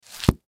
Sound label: Book Thick Book, Book Thick Book Close; Books (Sound Effects:Offices); Foley; Blastwave